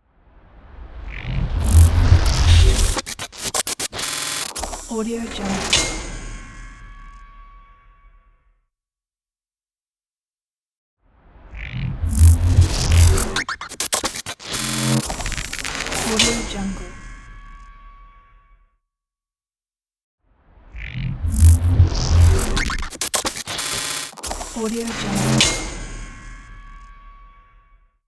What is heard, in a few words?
rapid technological transition